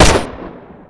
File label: ump45; cstrike; ZP CRISTIAN; ZP WEAPONS